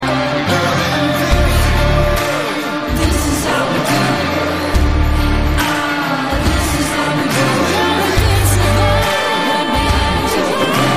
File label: Vocal